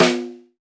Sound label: House Snare; Snares